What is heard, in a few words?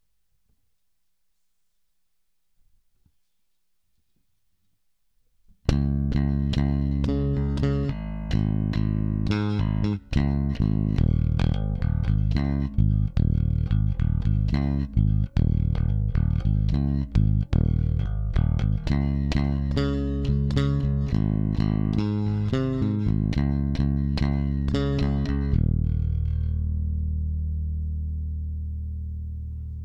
Bass; Pro Tools